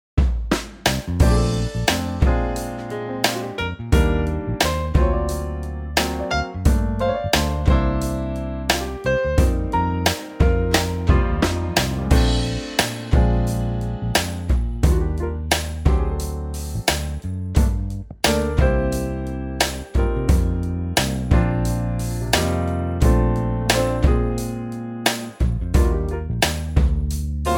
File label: Bb Trio